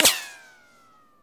vistrel streloj iz luka

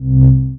knopka cifrovoi odinochnii monotonnii nizkii

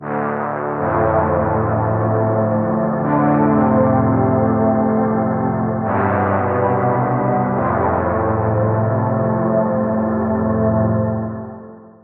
korotkij riff v orkestrovom stile

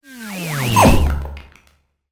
multfilm perdet pobeg speceffekti zvuk

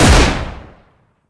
odinochnij pistoletnij vistrel v bunkere

